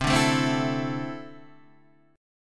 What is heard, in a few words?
D 7th Suspended 2nd, C